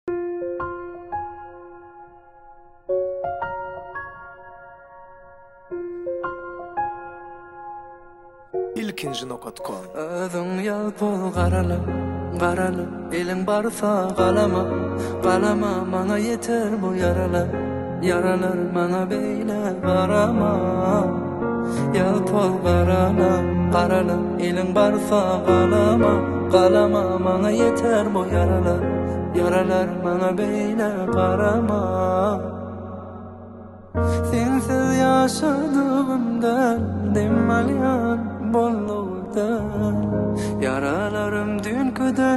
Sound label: acoustic version